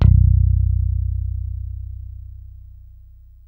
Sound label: ROCK, PICK BASS